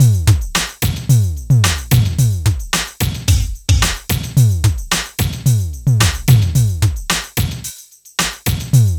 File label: HOUSE, Funky; INIS